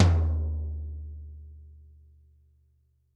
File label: Toms, Drums; Bob Clearmountain Drums I